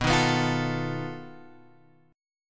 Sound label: B 7th Flat 5th B Guitar Open G